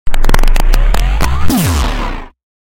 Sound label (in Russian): lazer oruzhie zvuk